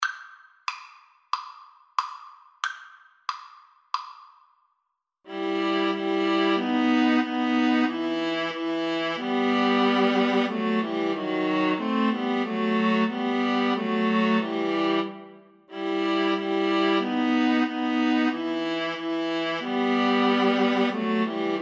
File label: viola trio